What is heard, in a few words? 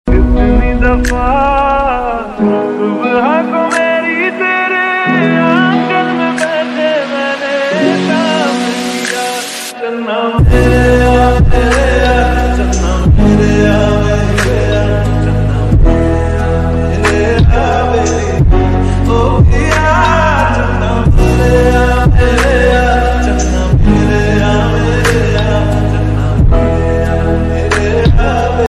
lofi remix ringtone